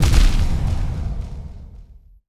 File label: Double Damage